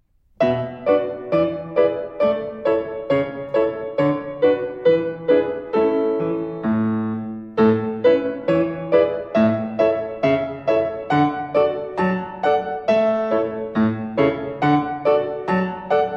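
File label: Song of the Wind in medium practice tempo, violin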